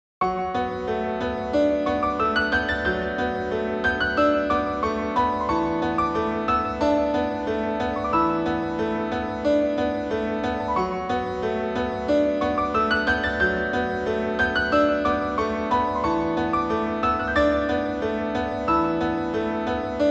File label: Strings bpm 91